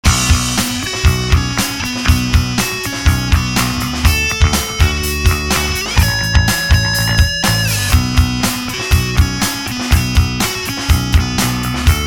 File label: Fortune Loop